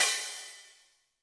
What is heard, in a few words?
HiHat & Crash, Roland TD-20; HiHats